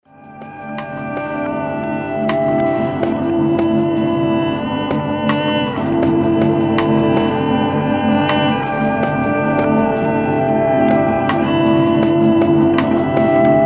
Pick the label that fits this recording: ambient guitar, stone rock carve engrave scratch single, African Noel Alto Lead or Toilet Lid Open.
ambient guitar